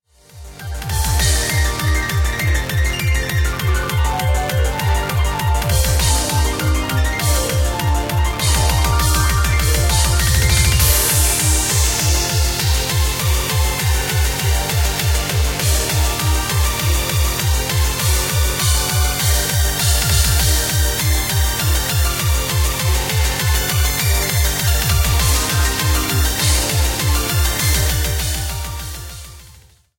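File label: background music crossfade; Invaxion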